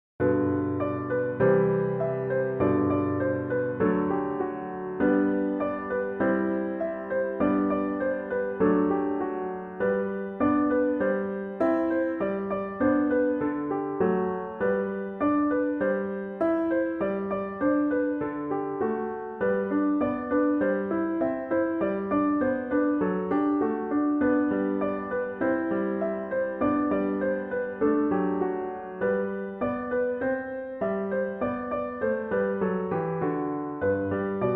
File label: Melody LH patterns